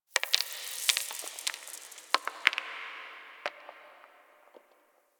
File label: Moon Dust Fall Small; Ambience; Warframe; Orokin